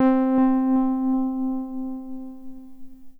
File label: Prophet REV2 KEYS Echo Low - C; One Shots; Cymatics; Fantasy Synth Sample Pack